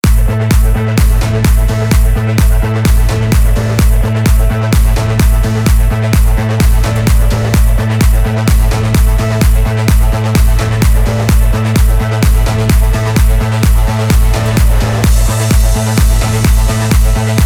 deep space electro punk mix

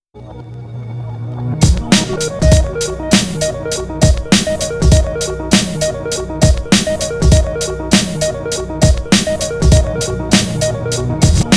piano interlude

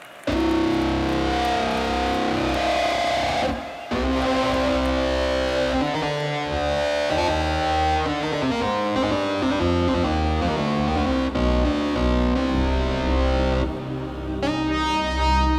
keyboard solo

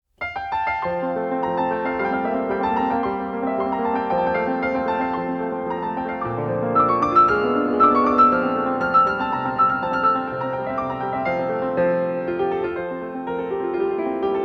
piano solo fast

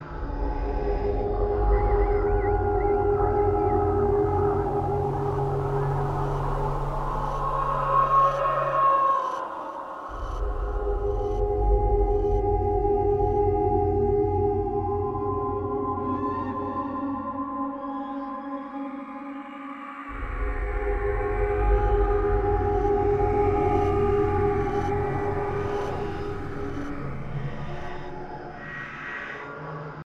village amb woods

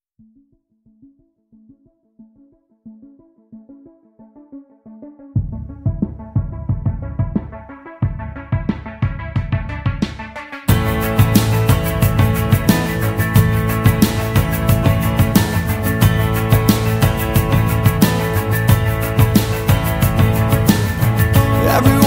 acoustic

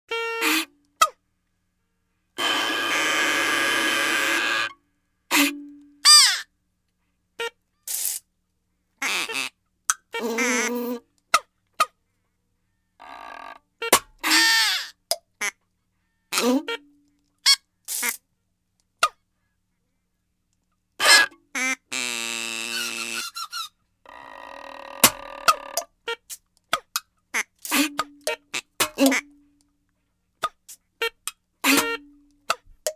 acoustic version

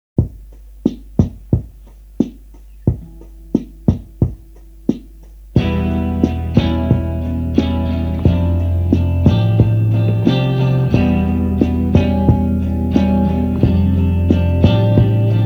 home instrumental

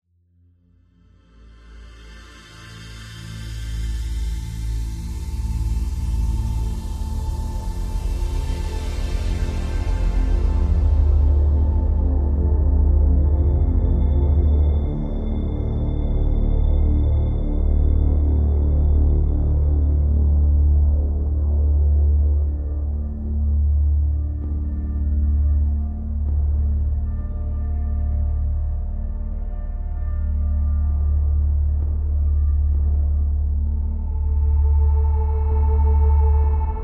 ambient

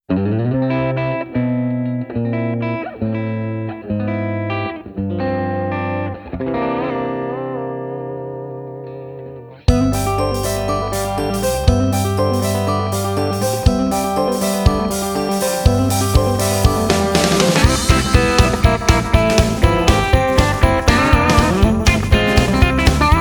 jingle bells instrumental

